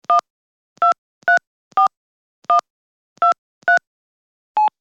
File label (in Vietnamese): Am thanh tieng bam so dien thoai